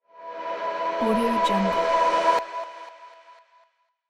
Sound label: transition cinema airy medium